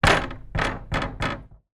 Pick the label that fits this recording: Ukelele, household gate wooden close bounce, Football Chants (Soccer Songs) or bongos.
household gate wooden close bounce